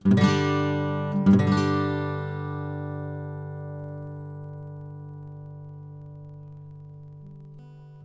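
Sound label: Auditorium OM Mahogany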